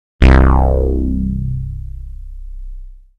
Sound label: Moogli-Bass